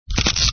machine

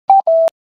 motorola sepura ptt

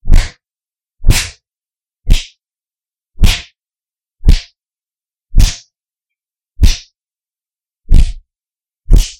many punches

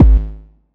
bass drum

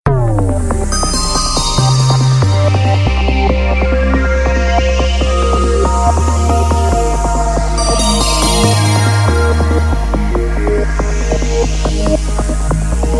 motorola ringtones precise

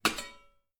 Pot Lid Open

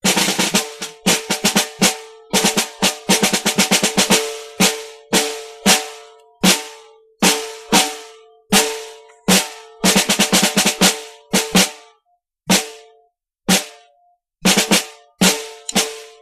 drummer war civil

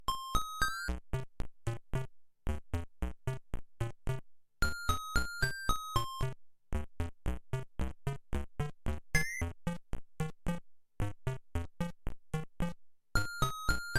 retro atari

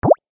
NAVIGATE

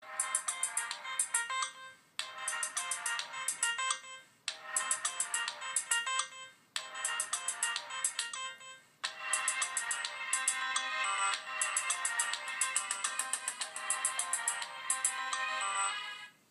motorola ringtones digital signal

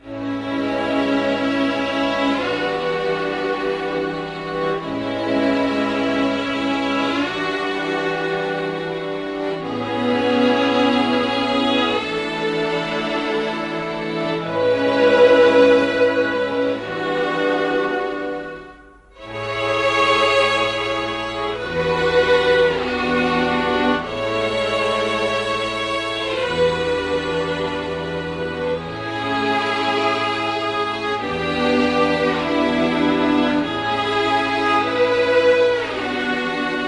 realstrings

